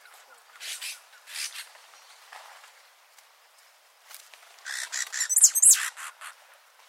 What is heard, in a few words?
coryphospingus pileatus